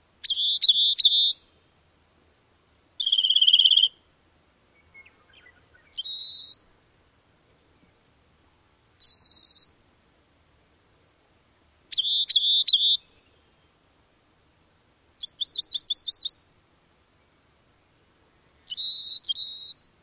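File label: song, Rock Wren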